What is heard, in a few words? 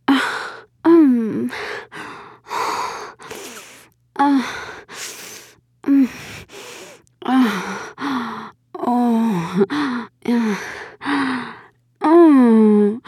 Woman A Moans normal